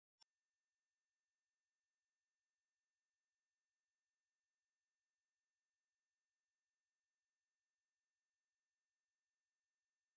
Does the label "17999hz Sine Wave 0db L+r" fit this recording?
Yes